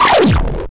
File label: gameover